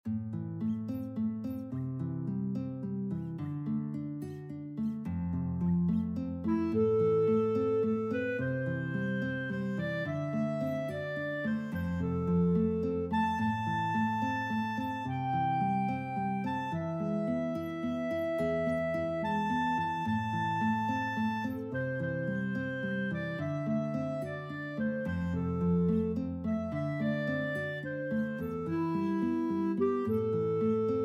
house of the rising sun guitar clarinet, guitar clarinet duet